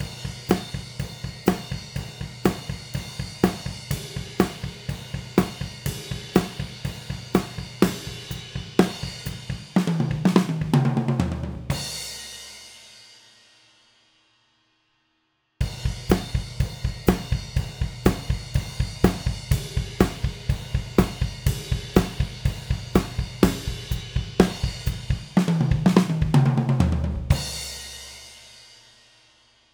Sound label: Drum OH KM84